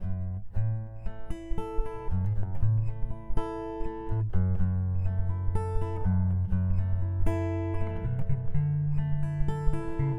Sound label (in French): guitare voix